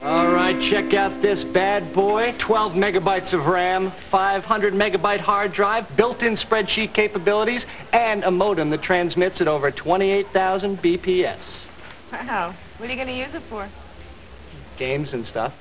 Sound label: computer